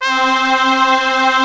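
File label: Trumpet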